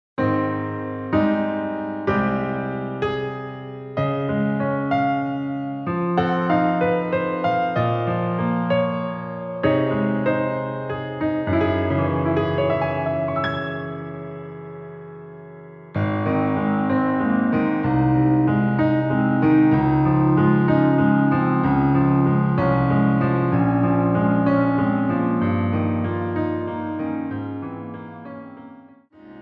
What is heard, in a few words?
piano